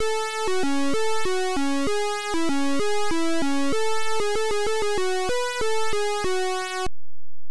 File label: Title, Beeper; Fire Lord